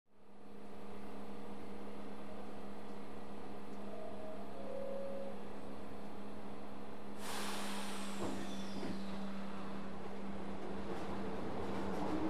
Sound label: Subway Platform Clos, subway